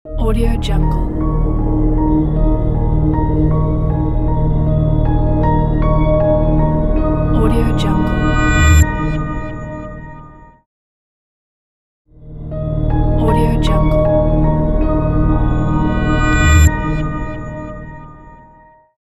soft spiritual logo

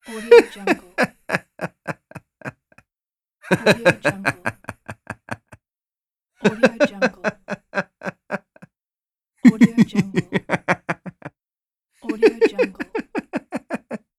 male laughing

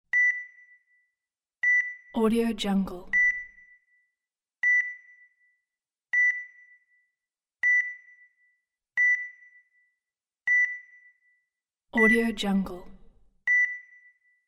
ekg monitor beep

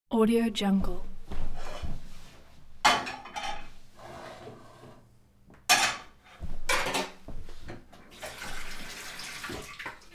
common kitchen ambience

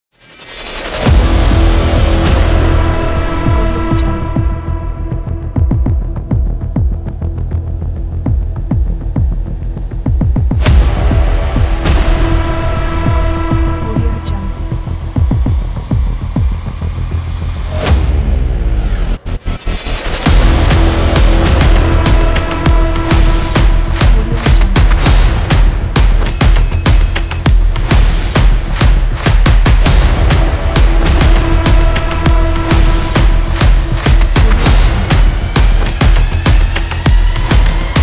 intense hybrid trailer intro

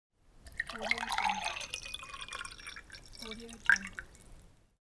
pouring water; ave-music

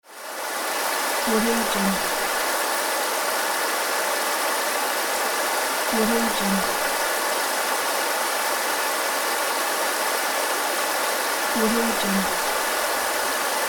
river

